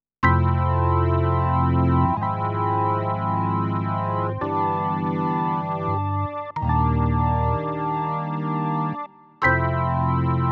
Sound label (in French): M50 son B3; korg M50 61; workstation